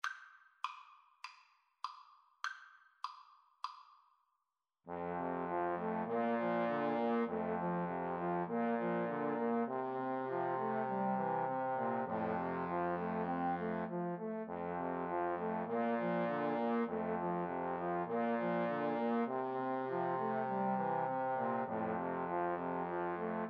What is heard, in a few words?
Trombone Trio